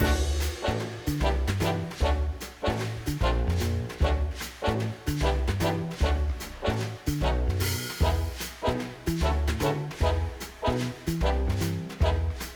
Menu Layer

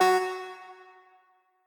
Quasar Move F

